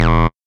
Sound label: Error Sound